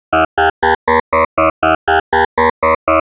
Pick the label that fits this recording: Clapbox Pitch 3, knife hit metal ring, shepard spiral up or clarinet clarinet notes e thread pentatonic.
shepard spiral up